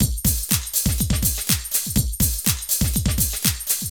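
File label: drum loops, left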